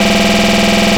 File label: Jungle Snare Roll; Snares